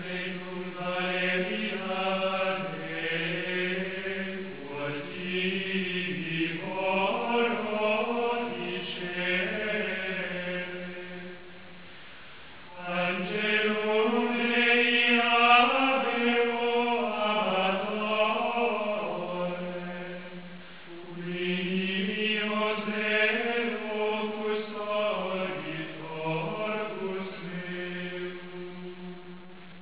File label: chant